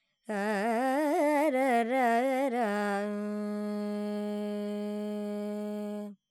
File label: Lydian, Vocals, Carnatic Female, Alaps, Dry; Sample Banks